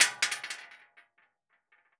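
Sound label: bullet case bounce 308 metal; gmod; viper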